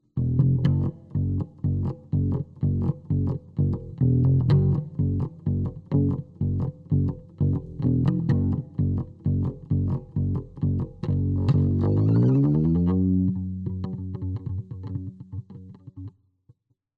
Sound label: bass; Dripping Ignorance